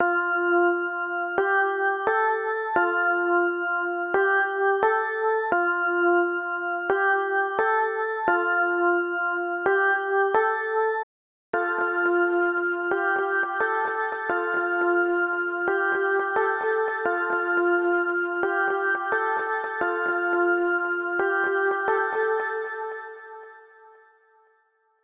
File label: fx delay; plugins